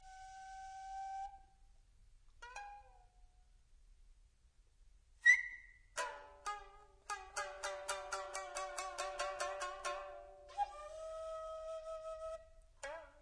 Quartet; Pilgrimage VI Storytelling

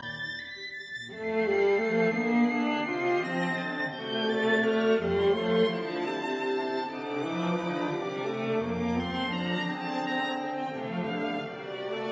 String Quartet Doux et sans hate; Milhaud; Op 87

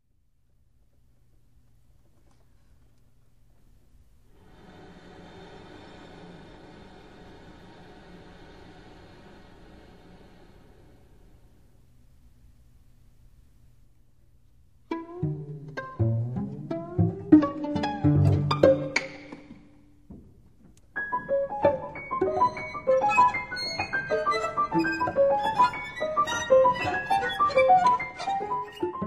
Trio for violin, cello & piano Postlude

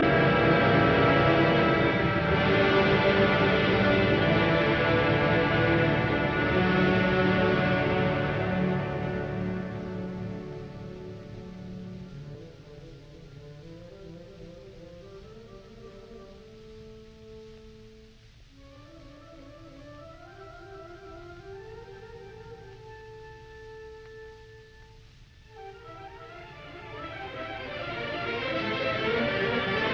Toccata and Fugue in D minor; Bach; arr L Stokowski; Leopold Stokowski

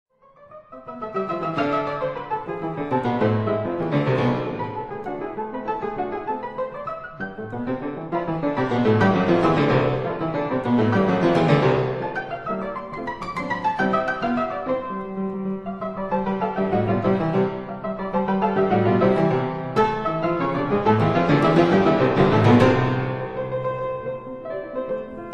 Etudes for piano, A minor

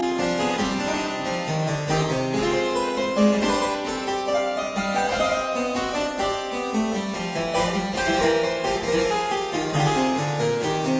French Suite No 1 in D minor BWV 812 II Courante

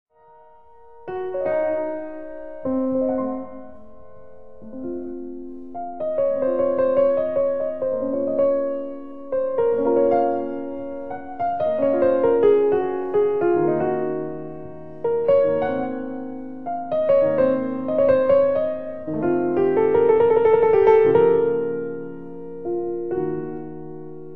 Clavier E-flat minor Prelude; The Well-Tempered Clavier

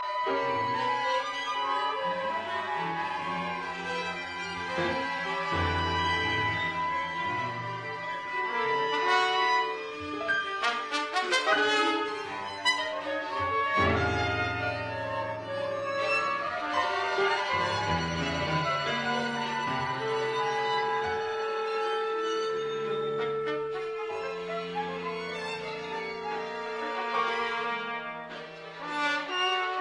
Piano Concerto; Escot

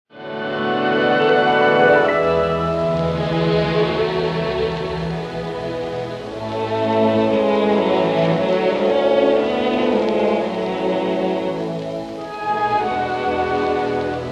Piano Concerto in A minor, Allegro molto moderato; Grieg; Op. 16

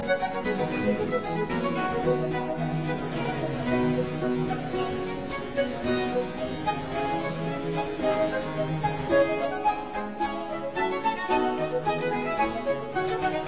Quartet Allegro; Quatrieme livre de 6 quatuors; Quartet No 4 in C major; TWV 43C1

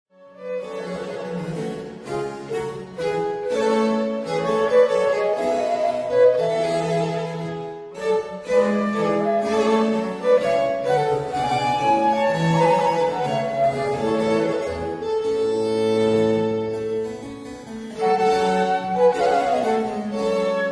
for 2 violins & continuo Couran; Les Nations; Courante

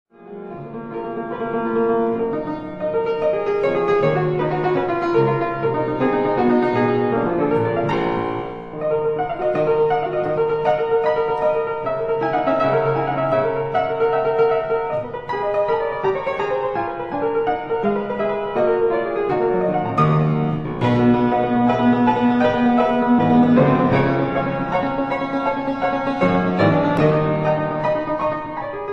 Etudes for piano E flat minor

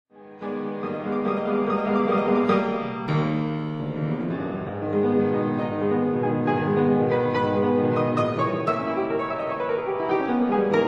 Piano Sonata in F sharp minor Finale Vivace; No. 5; Op. 81

